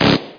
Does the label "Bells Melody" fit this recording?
No